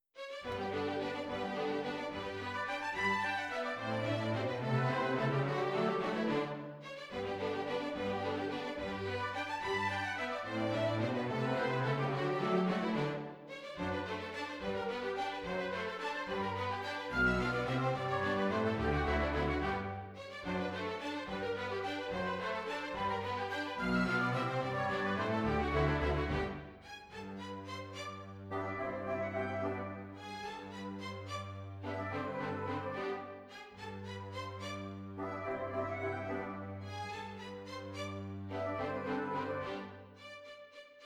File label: orch